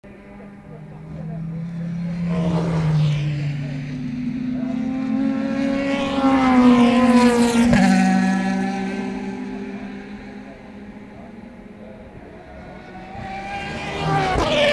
Cars in Tertre Rouge Sat afternoon Aston Martin Audi Aston Martin